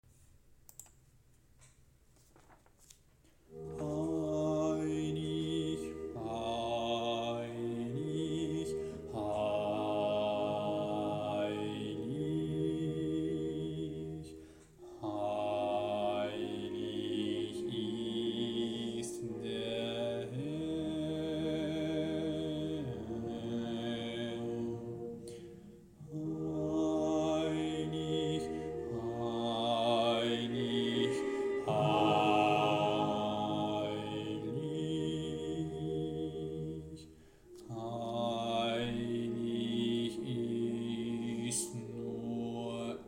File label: sanctus basses